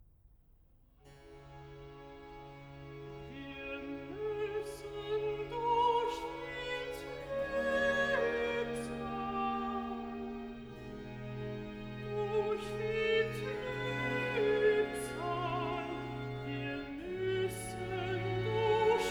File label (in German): Recitativo (Alt); Cantata, BWV 12 'Weinen, Klagen, Sorgen, Zagen'; Bach; Altenburg; 3rd Sun After Easter